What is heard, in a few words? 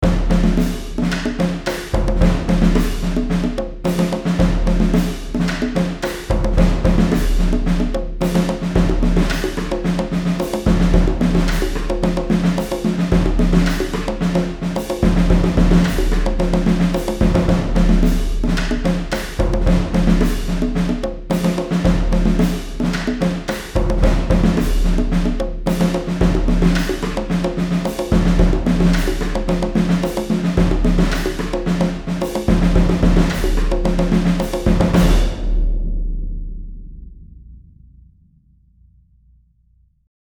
Percussion Intro